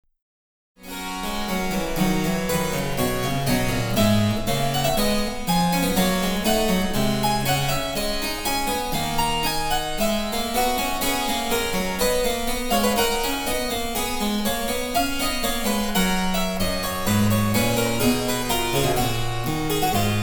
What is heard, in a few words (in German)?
Cembalo; Mark Lindley WTC 1